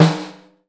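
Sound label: BRAZIL piccolo, Percussion; Voice Crystal - Steve Reid Definitive Percussion; BRAZIL SET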